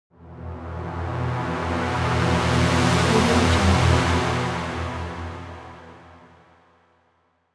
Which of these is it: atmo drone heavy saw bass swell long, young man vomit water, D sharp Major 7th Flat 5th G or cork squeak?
atmo drone heavy saw bass swell long